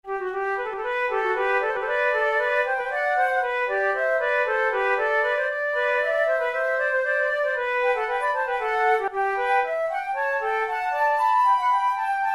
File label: vivace, flute tunes